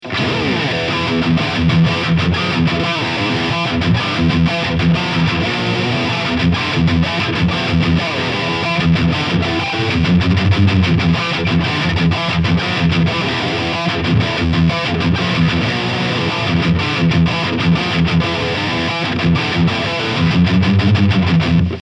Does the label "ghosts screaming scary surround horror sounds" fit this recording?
No